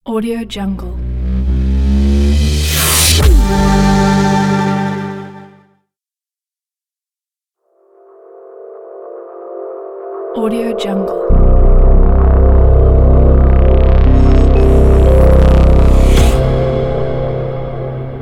ambient intro logo pack

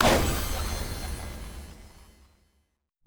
danger flourish

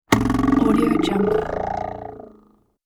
knife table woble; hillmusicmedia

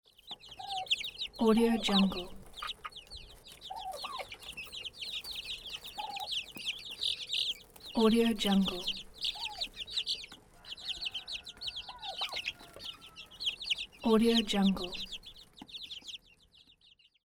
chicks chirping ambience

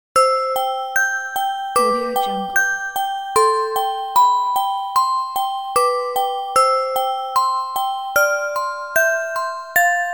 lullaby bells

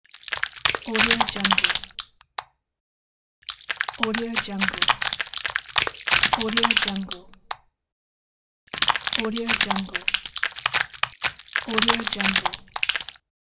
guts out

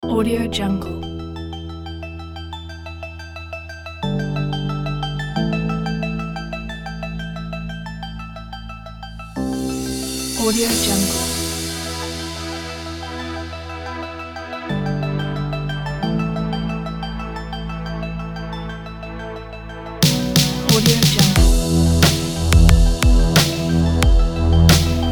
hip hop

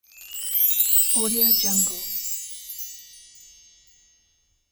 this magic wand

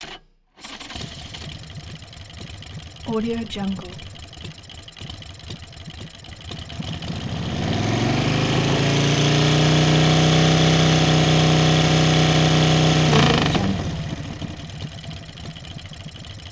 harley bad boy

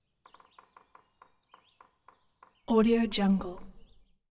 ext woodpecker spring

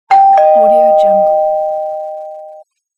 doorbell

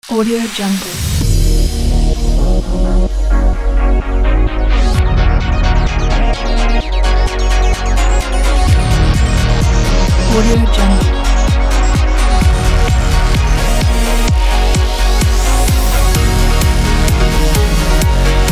background electro house pack

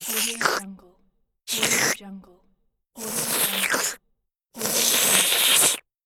the cartoon lick

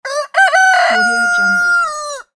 rooster crowing; songparts

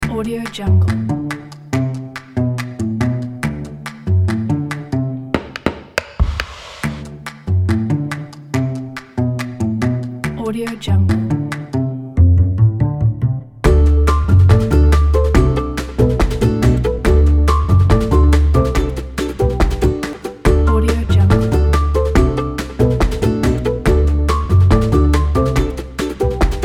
gallant indie boogie

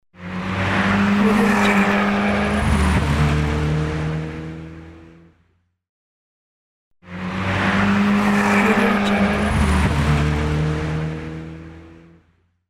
turbo car passing by; soundfood

